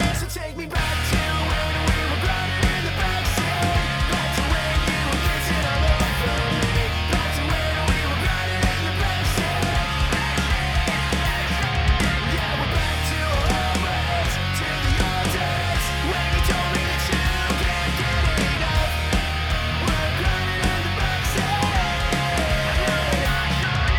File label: ROCK No FUSER